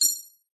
science fiction beep bright feedback positive command